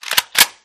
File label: switch, guns; groza; resurviv